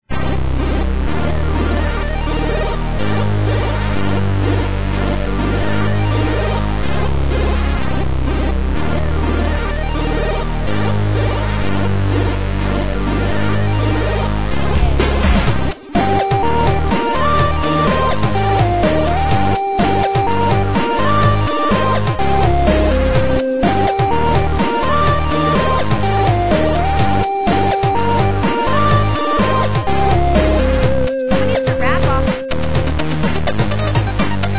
song; sven

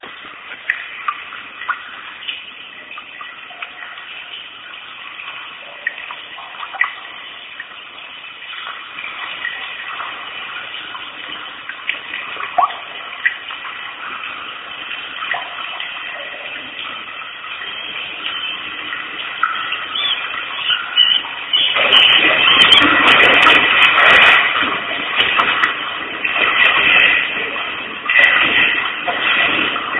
pomp station, ambience

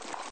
spas12 foley, weapons; sci arms

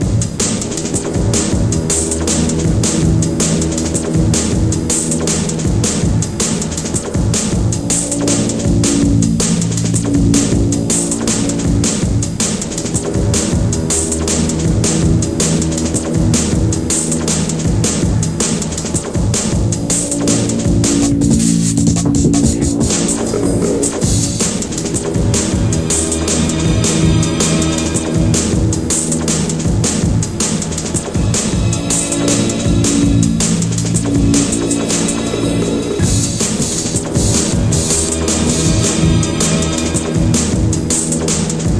fight, 8bit

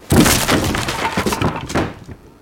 break wood

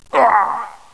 death; residuallife; ngrunt

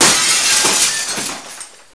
bust glass

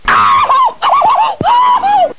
tom

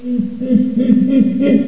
gorilla

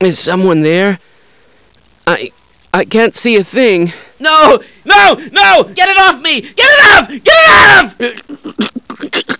sci guy die

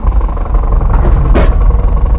fan

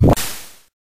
drop; tails

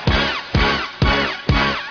zap, debris

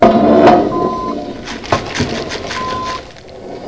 freight move

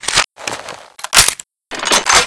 tau cannon reload, weapons

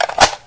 9mmar clip; wartso

